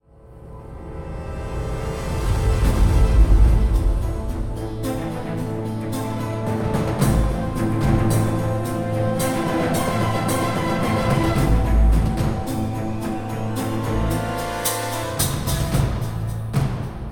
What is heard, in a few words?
Fight Theme Tension Fast Start; ryse son of rome 2014